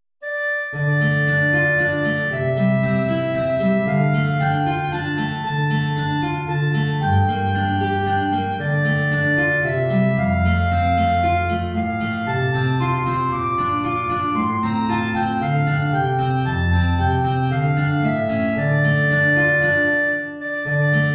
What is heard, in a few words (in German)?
Klarinette in Bb & Gitarre, hoch; Es kommt ein Schiff, geladen